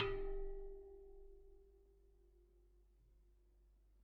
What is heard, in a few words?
Bicycle Spoke